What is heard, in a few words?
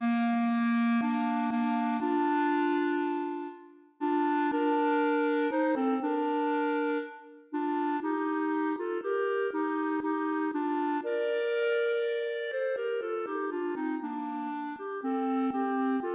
cl duet